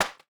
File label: wood hit